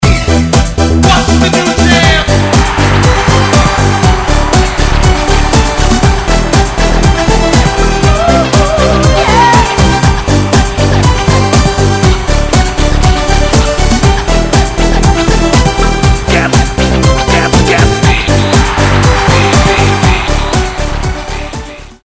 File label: beat city 120bpm; sample